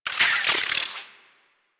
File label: Robo Fish Medium Caught